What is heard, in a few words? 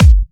Kicks; Vengeance Samples